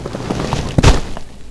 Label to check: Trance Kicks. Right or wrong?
Wrong